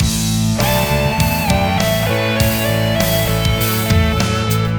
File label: Classic Rock, ringtones; Google; NewWave Labs